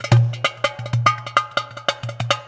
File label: DARBUKKA; Best Service; World Colours; DARBUKKA MLT